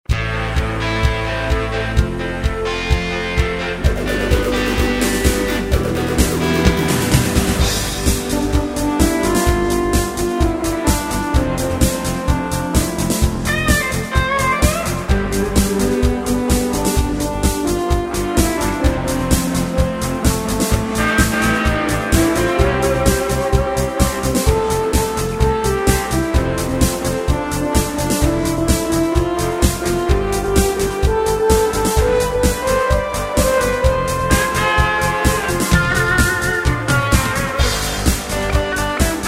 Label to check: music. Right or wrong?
Right